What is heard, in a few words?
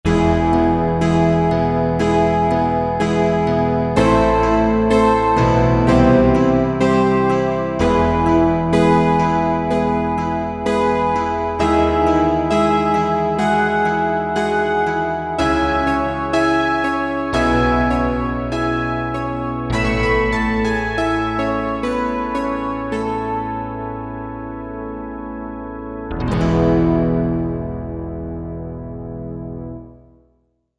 Dream Piano, Korg MSC-12S Synth; Synth 3